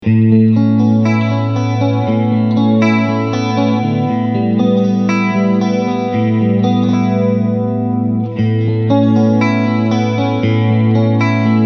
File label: Nux Mighty Air Jazz Chorus Chorus